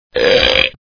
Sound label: BELCH